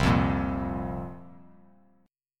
C 7th Suspended 4th, guitar; C7sus4 strummed

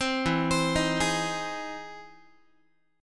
E Augmented Major 7th E down Guitar; E+M7 arpeggiated; JGuitar

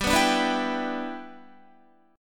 G 6th, Guitar; G6 strummed

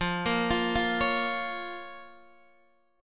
F Suspended 4th Sharp 5th Guitar Open D, chord; x,8,8,11,8,11

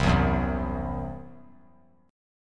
C-Major Flat 5th; strummed

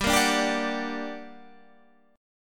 G Minor Major 7th, down, Guitar, Standard; GmM7 strummed; JGuitar

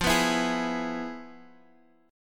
F Minor Major 7th Flat 5th Guitar Half Step Down

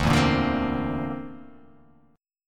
D 7th Suspended 2nd Sharp 5th D

